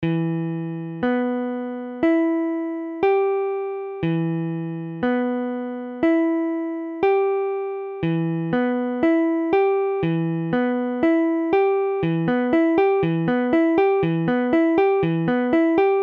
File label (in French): VII Em, guitare